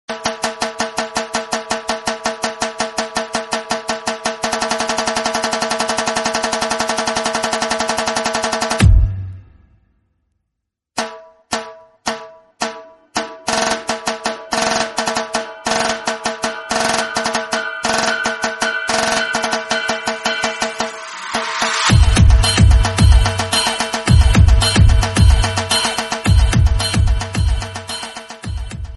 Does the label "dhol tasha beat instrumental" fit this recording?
Yes